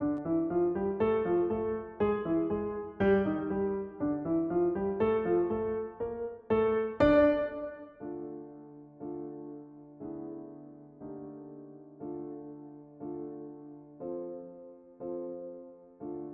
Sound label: In the Hall of the Mountain King Piano Accompaniment